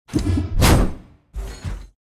Training; starbites

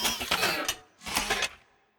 Mantis Onde Moove

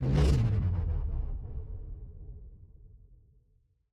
mecha powerdown

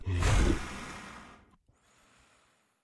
AMB China 3D Amb; Rabbids Multiverse

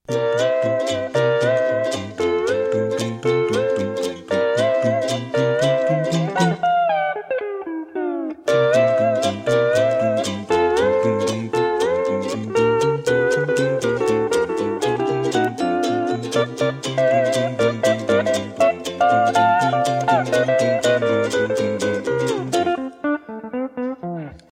Hawaian; SpongeBob's Next Big Adventures

